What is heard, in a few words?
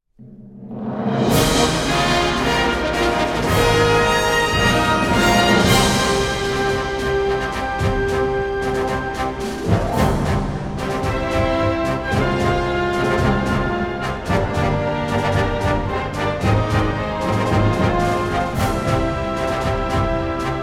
bgm clear; monster hunter 4 ultimate